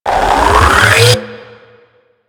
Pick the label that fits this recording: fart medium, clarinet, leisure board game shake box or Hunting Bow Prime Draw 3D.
Hunting Bow Prime Draw 3D